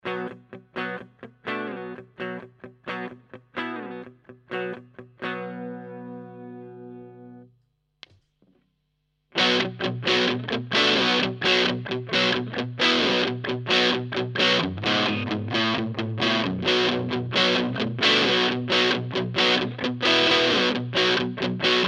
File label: EQD Plumes Mode 3